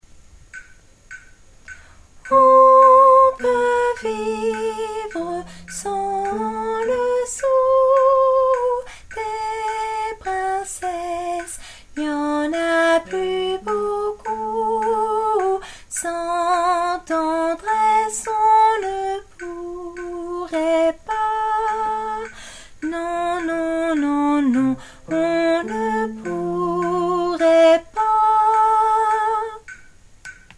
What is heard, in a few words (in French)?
debut hommes